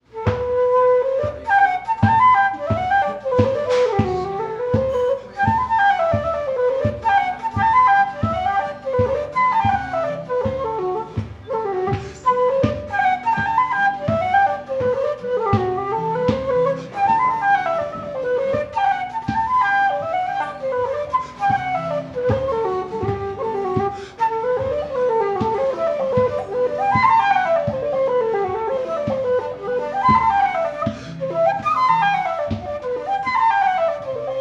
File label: Pub Flute